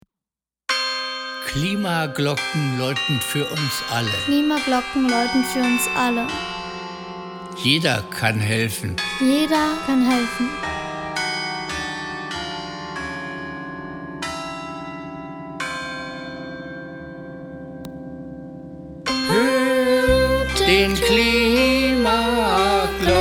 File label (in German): Intro mit Stimmen